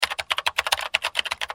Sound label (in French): Clavier